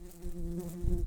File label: Humilis workers, bumblebees